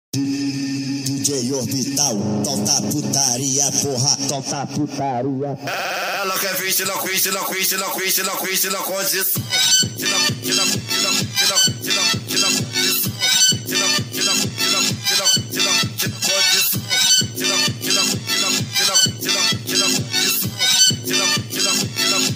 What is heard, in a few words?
Montagem, funk